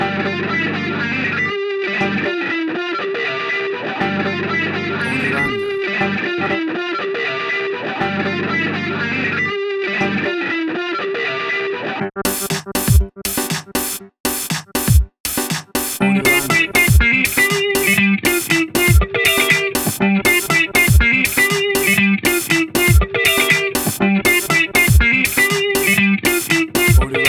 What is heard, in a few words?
Funky House